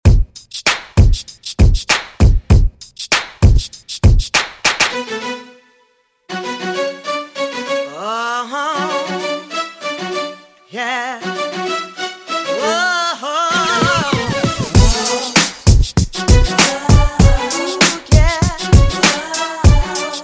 throwback re drum

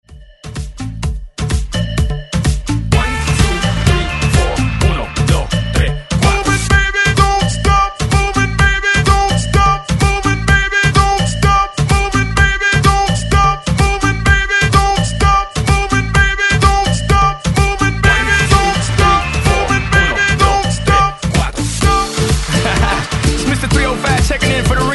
Hype 128 Bpm; DJDOLLS

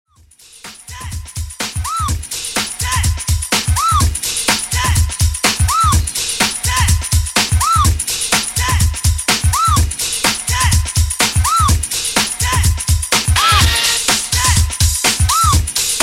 80s Hip House Redrum 125 Bpm

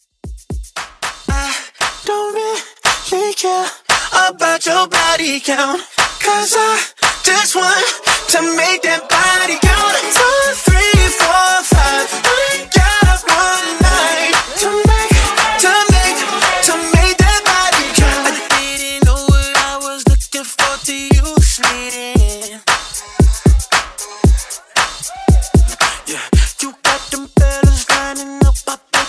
Re Drum Upbeat 115 BPM; Friday Leaks

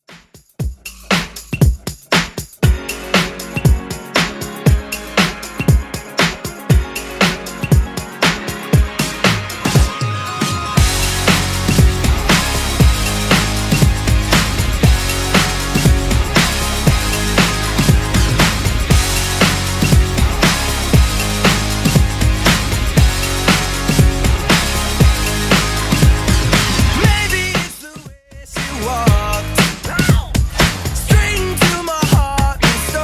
20s Redrum 118 bpm

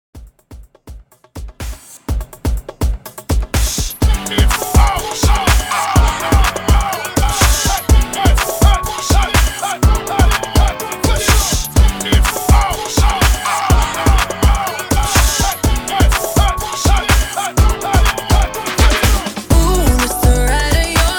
mix 124 bpm

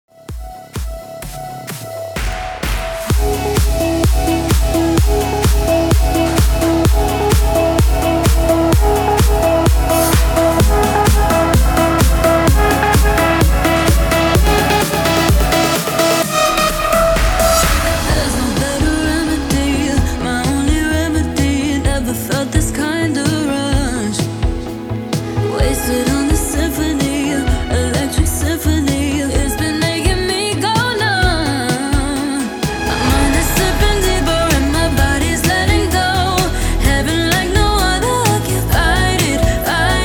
PROGRESSIVE 128 BPM

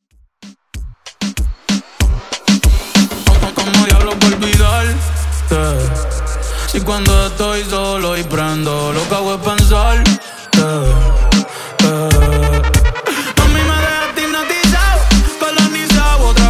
Intro Outro 95 Bpm

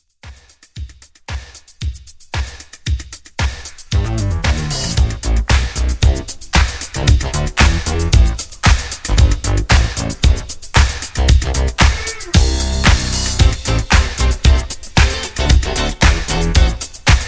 80s Disco Re-Drum 114

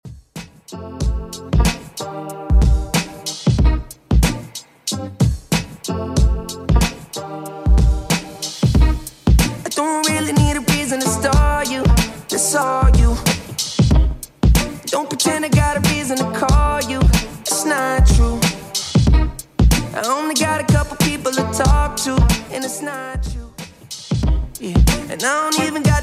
Top40 Extend Redrum 93bpm

